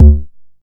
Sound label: Bass Drum, Jomox Xbase-09